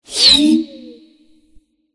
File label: Tennis; Sfx Tennis